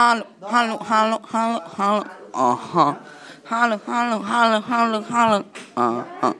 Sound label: haha